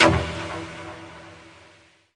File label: Synth Shots; Vengeance Samples